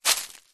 foley footstep single soft shoe dry leaves forest floor; zapsplat